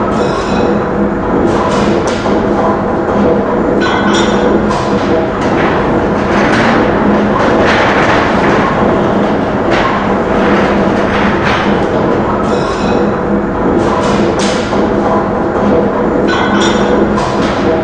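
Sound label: ambience industrial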